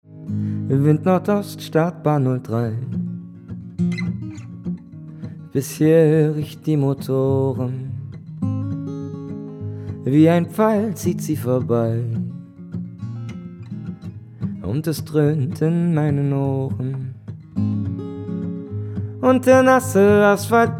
gitarrist mit gesang

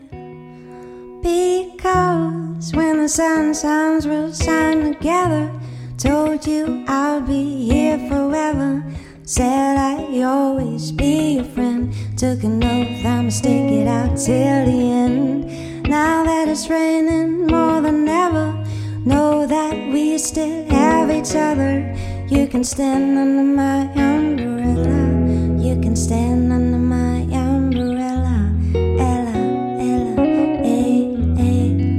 musikduo

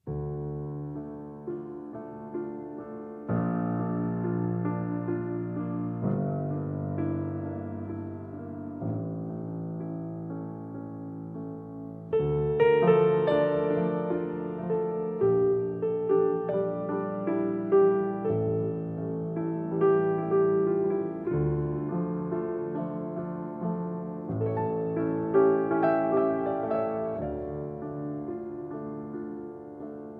klassik pianistin

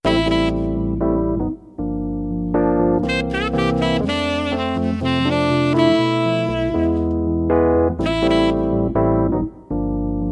lounge musikduo

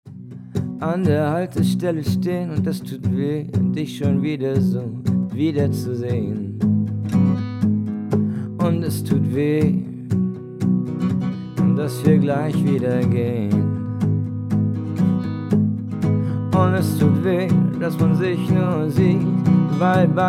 gitarrist mit gesang

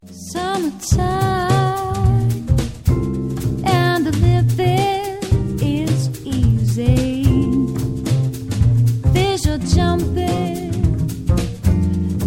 medley quartett mit gesang

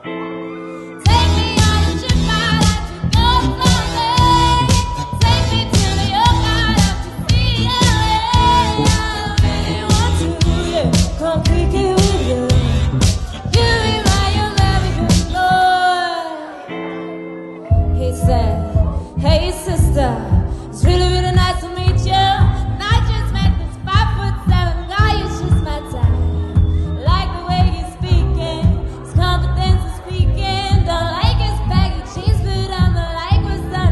soul rnb saengerin live

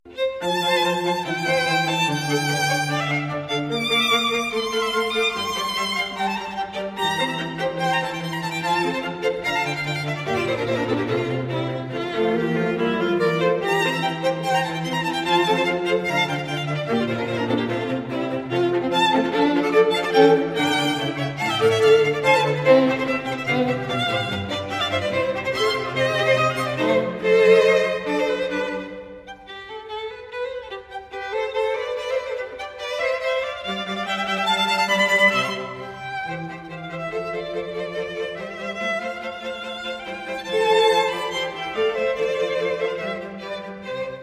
streichquartett; divertimento

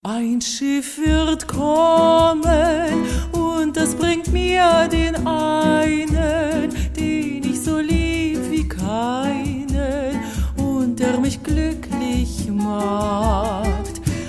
saengerin deutsche schlagerchansons trio

